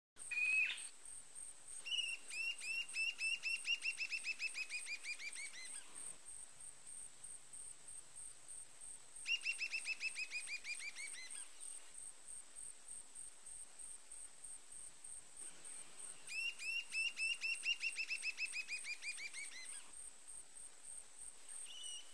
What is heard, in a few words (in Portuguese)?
Canto do arapacu de cerrado